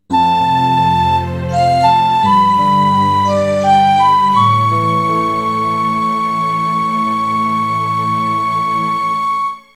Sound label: Fairy Ocarina Get; Ocarina of Time